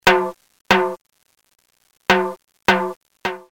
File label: timbales